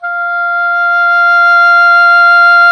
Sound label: oboe dry; Roland L-CDX-03 Disk 1; Oboe 4-8